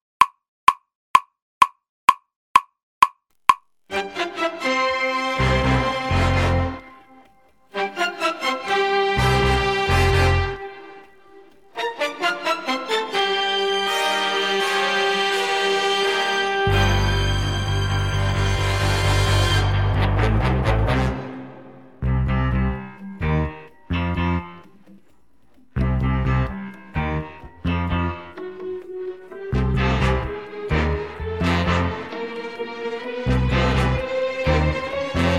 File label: Drumless